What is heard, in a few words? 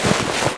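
armor light; The Elder Scrolls III - Morrowind; Morrowind GOTY GOG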